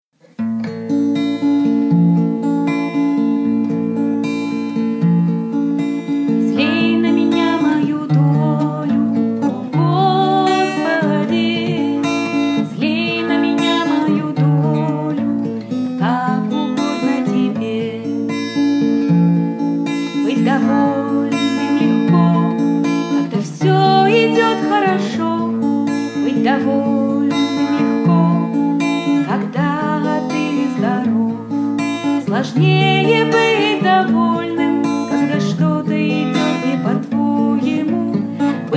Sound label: Song, RU